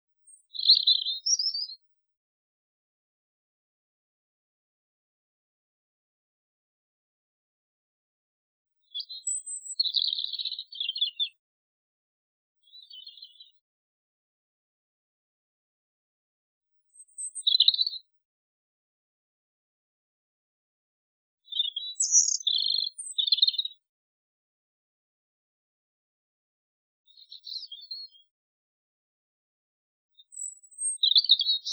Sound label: Birdsong Isolated; Animals